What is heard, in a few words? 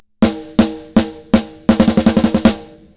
Overdrive 45Hz